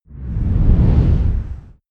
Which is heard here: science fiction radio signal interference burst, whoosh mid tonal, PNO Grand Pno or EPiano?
whoosh mid tonal